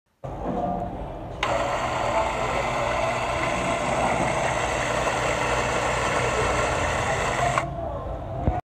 Coffee grinding

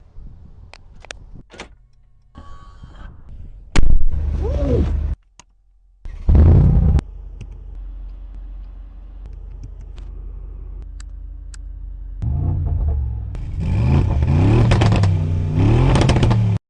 G Class exhaust

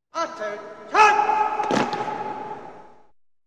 Parade of soldiers

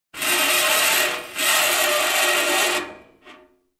scraping iron

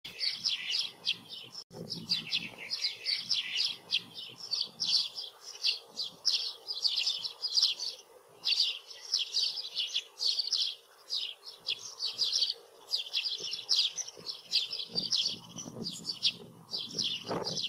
tree sparrow sound

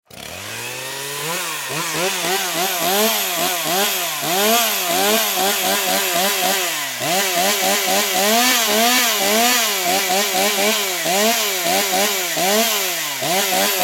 chainsaw sound

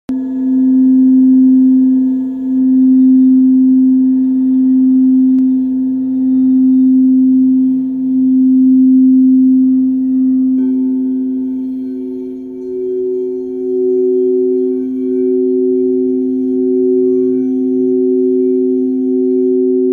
Vibrational

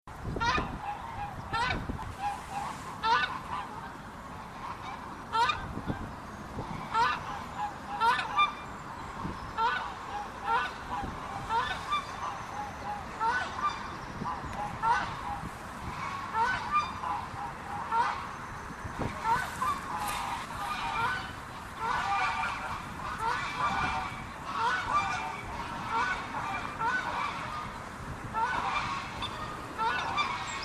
Swan goose

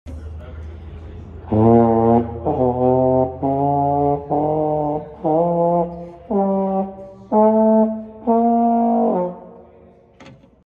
Euphonium; یوفونیوم

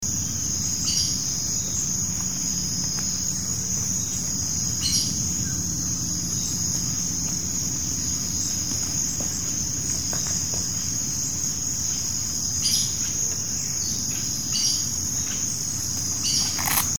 Aracari

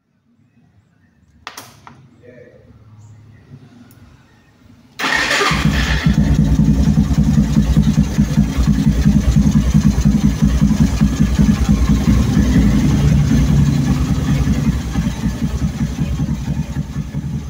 motorcycle; موتور مگلی

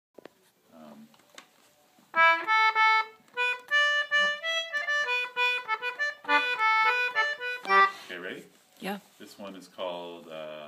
Concertina; کنسرتینا

